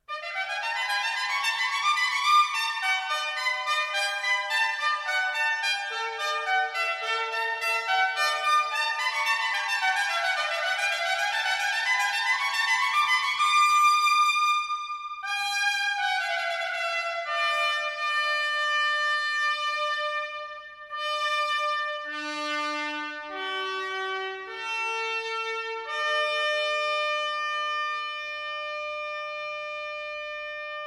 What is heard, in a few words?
Abblasen Fanfare, trumpet; bach; reiche